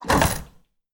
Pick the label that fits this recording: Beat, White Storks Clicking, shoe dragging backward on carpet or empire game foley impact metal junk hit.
empire game foley impact metal junk hit